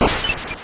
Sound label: Icicle Crash; SMM2; SMB